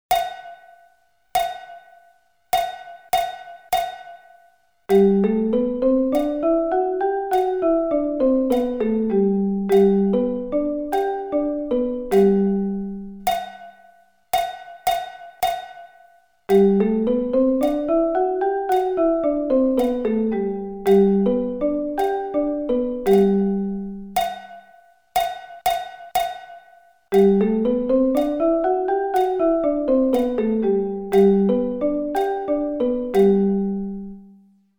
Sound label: G Scale